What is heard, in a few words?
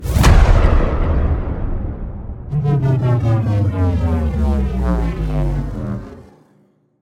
Banish